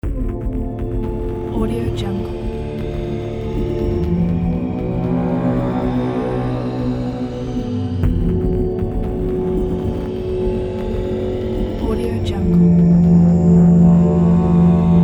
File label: astro heroes epic adventure; موسیقی بی کلام; حماسی